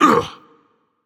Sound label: grunt hit, dragon; fafnir